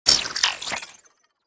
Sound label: Glitch accent distorted computer